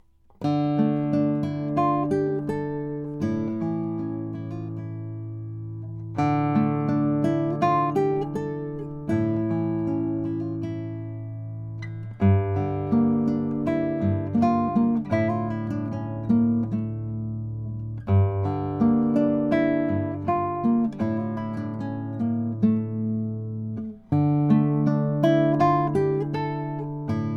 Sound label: greenfield c1 concert classical moon spruce